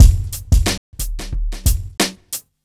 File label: Club Tip, R&B Drums